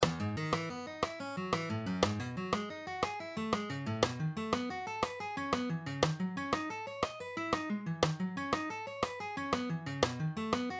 String Skipping Exercises, Arpeggios in key of E minor; Frank Gamble’s Chop Builders